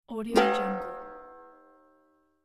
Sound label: jump